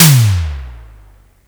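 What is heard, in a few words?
Simmons tom, SDS 8 Tom (Long); Simmons tom 4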